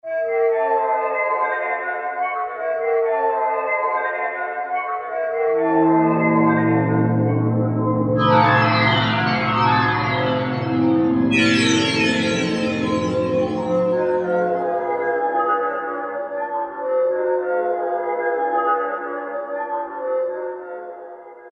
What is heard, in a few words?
Waterphone, Korg T3